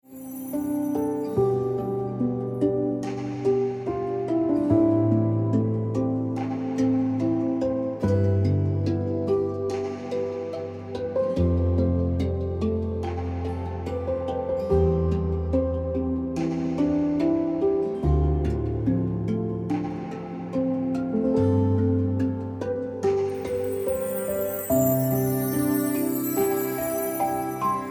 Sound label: Ringtone Piano Music